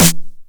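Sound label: 909 SD Tape, snare drum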